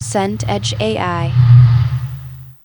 rumble pass

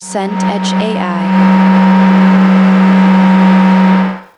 fan desk

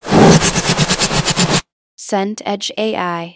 fire whoosh

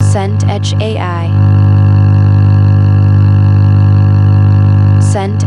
bed transformer hum

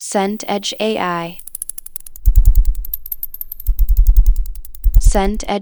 ember hiss

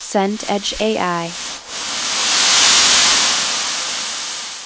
wind trees leaves

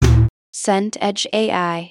tom high

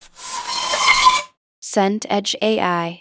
cardboard slide

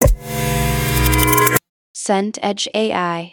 swell woody

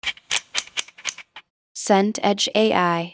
scroll detent run

